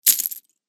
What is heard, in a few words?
other player cash out